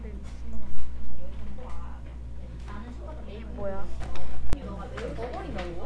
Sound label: tractor